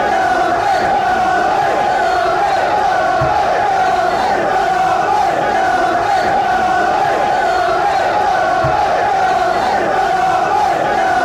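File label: red army, football chants; barnsleyfc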